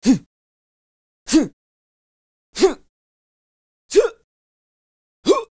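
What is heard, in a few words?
boxer shouts on strike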